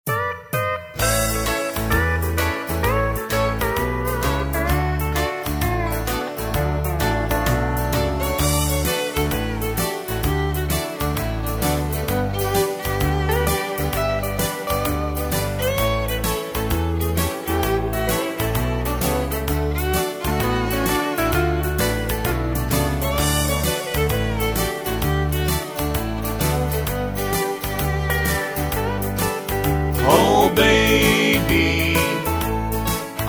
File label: harmony sample